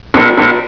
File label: beep beep